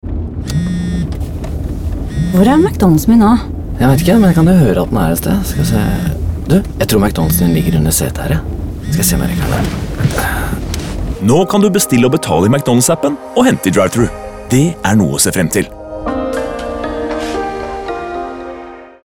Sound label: Bzz